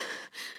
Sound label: Breath; Lewd SFX